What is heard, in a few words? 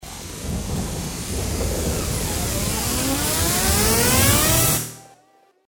anticipation Continue; Champions of Valhalla